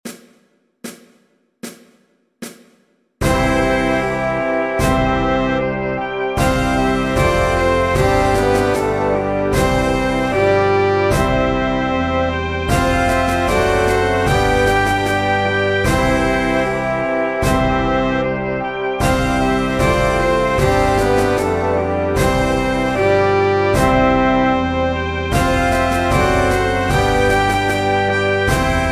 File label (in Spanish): Himno de Espana Saxofon Alto y Saxo Baritono; en la mayor